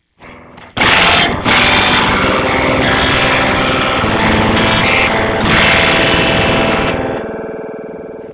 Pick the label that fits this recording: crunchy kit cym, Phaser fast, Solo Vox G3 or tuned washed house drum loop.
Phaser fast